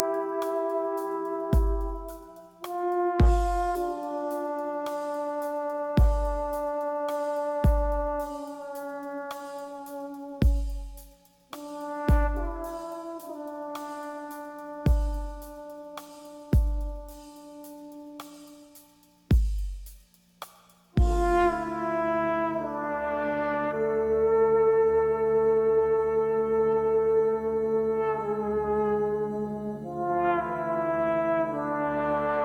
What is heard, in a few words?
dread drop; lyria